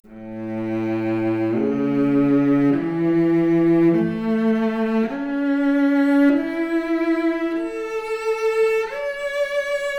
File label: Violoncello arp, Stereo; 3bit